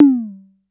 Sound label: Tom; Ableton Live 12 Lite; Core Library; Drums